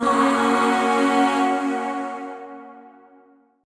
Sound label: Shawzin Hirajoshi Chord H; Warframe; Zariman; Void Shawzin; Chords